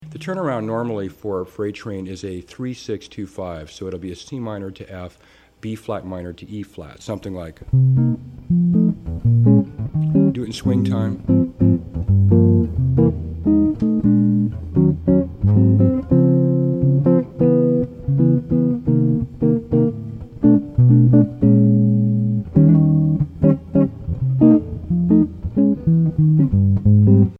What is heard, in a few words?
birds blues